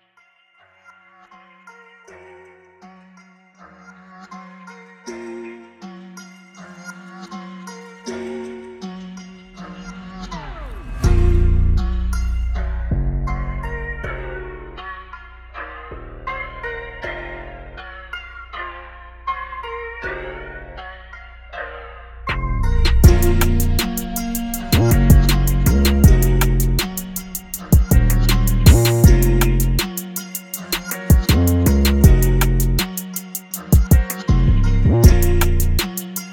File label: beat